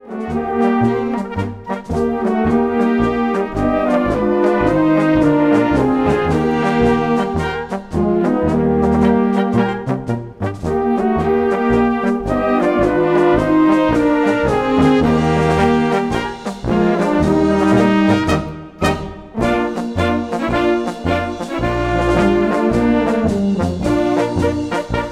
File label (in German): Polka